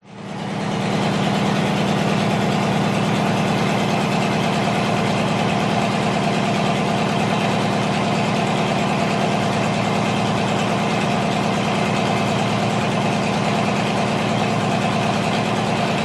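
warfare tank matilda mark 2 1939 engine warm up rev idle int hangar onboard ext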